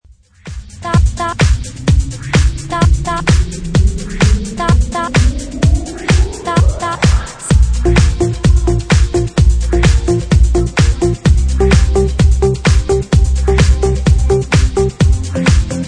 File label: Remix